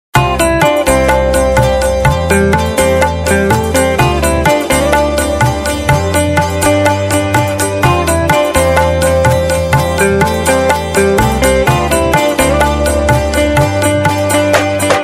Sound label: Simply guitar; Samsung; GT-S5222R Rex80